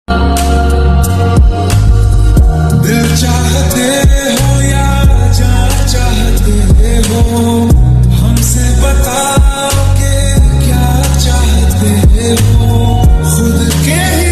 hindi ringtone

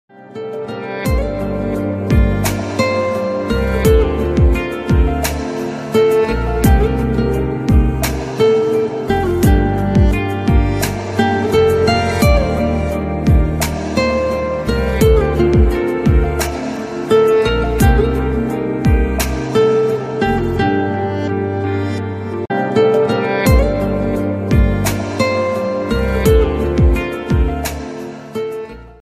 music ringtone

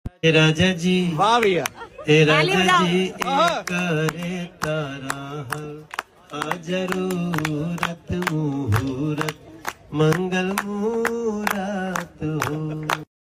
song; panchyat song

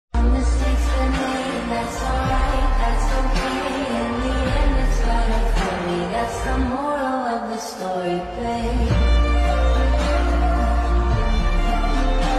slowed reverb ringtone

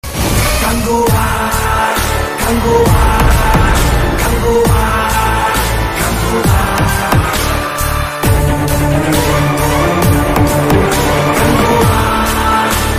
Title Announcement Bgm Ringtone; kanguva; suriya 42

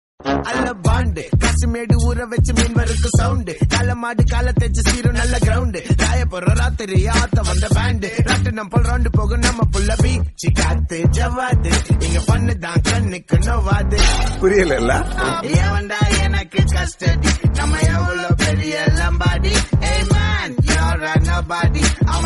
song bgm; custody

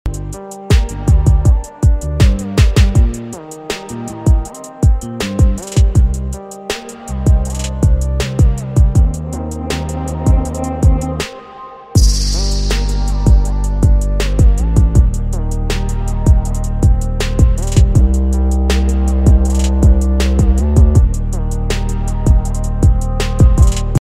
remix instrumental

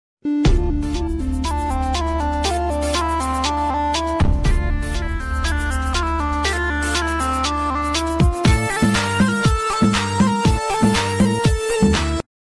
nagin dhun mix ringtone

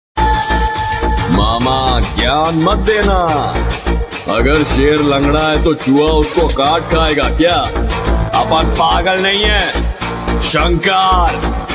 attitude ringtone; ismart shankar movie

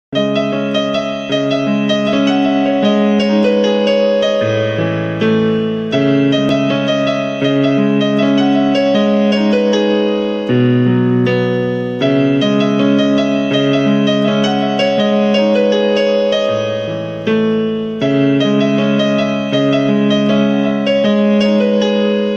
telugu movie bgm keyboard cover; anandam

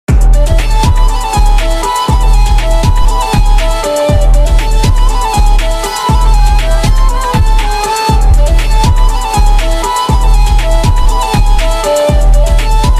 remix song bgm ringtone

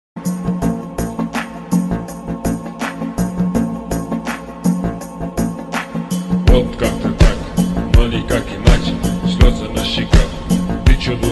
slowed reverb ringtone; instagram reels

